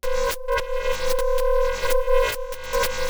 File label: Discordant Loop (120BPM); musicradar